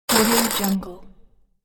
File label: plastic bricks; domestic sounds